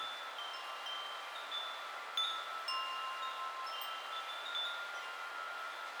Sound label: reel spin; netent